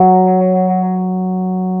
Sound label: NASAL BAS, Electric Basses; PlatinumPhattCD2; E-MU Producer Series Vol. 8 – Platinum Phatt